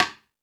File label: FX Sounds, Drum Machines E-MU Pro-Cussion